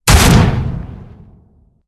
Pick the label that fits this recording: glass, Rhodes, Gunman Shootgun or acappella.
Gunman Shootgun